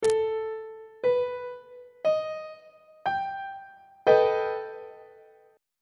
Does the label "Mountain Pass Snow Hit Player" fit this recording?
No